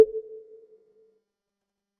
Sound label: tick